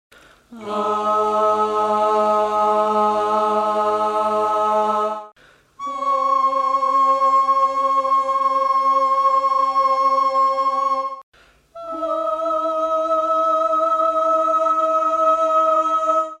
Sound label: females, mellotron